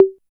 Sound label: 808 TOM; East West - Phat & Phunky